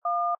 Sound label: DTMF 1